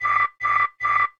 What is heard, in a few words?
marsh beep; ambient